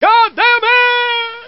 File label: death fall; svencoop; sc toysoldiers